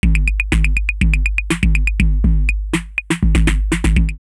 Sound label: 808 Heavy Sticks 122, drumloops, DrumMachines; X-Static Goldmine